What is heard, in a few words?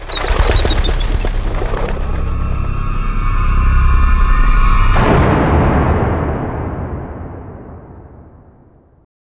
String; gamerip; How to Survive